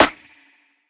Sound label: snare; dough-samples; eddyflux